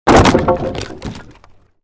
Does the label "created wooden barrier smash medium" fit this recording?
Yes